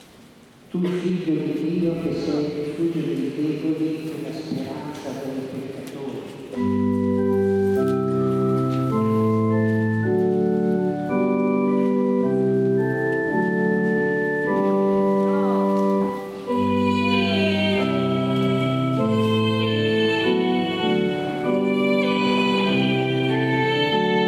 Kyrie Eleison, Coro

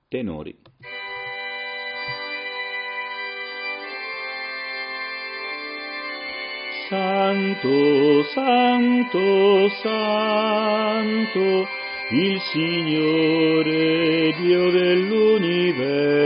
Tenori; Santo

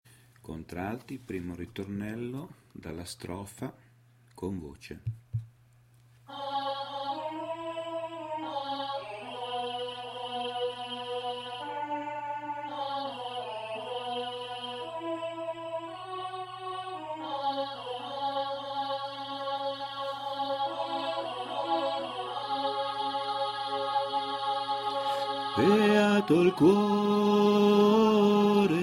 Contralti Voce Mus

